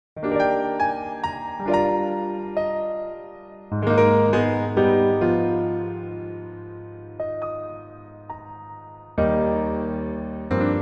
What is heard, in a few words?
Piano MR